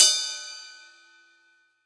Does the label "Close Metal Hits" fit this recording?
No